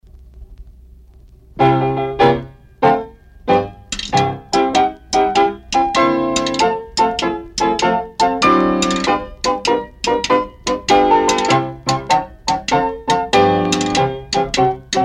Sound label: gallops faster